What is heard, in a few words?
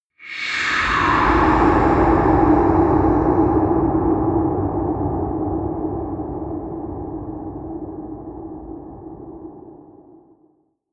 air sweep long